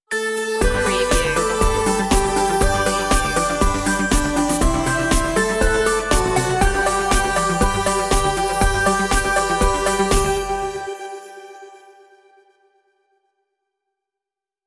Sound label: merry christmas synthified stinger outro